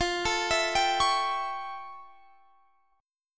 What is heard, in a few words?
F Augmented Major 9th, Guitar, Standard; F+M9; arpeggiated